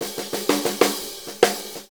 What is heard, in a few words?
Blues Fill; Sonic Foundry (Sony Creative Software); Loops & Samples Drum Tools; Fills